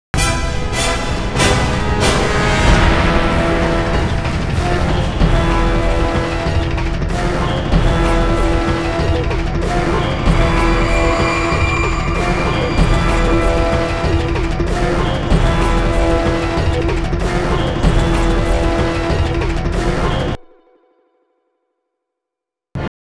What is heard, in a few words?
music action